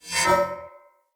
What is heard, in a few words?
Spear Whoosh Accent; Weapons; Enemy; Spears; Nimrod Spear